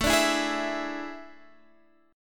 A Major 7th Suspended 4th, Guitar Drop D; chord

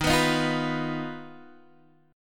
D sharp Suspended 2nd Sharp 5th D sharp; strummed; chord; x,6,9,8,6,7; Standard; JGuitar

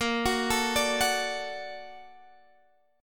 A sharp Augmented Major 7th; x,x,x,15,18,16,16,15

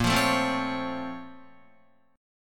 A sharp Minor Major 9th, down, Guitar; A#mM9 strummed; JGuitar

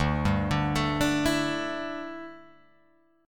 D Suspended 2nd Suspended 4th, Guitar, Open G

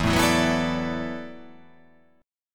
E 9th Suspended 4th E down

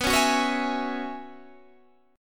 Gb 9th Suspended 4th B, chord

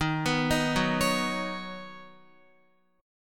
Eb Minor 7th Sharp 5th Eb down Guitar; arpeggiated

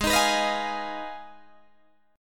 Ab Minor 6th, Ab, Guitar, Open G

